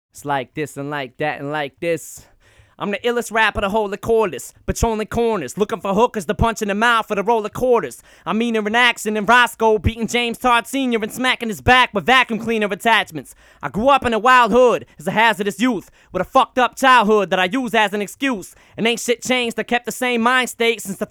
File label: Acapella Version